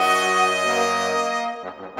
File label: Brass